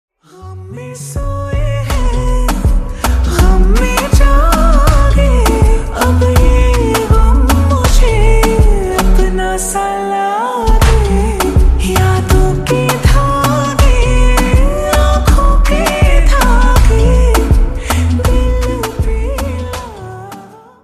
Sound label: Slowed Reverb Ringtone